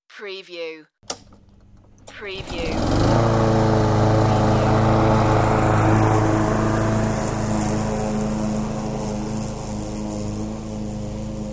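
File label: Lawn Mower Start Mow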